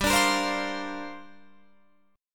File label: G Minor 11th G down Guitar Open F; Gm11 strummed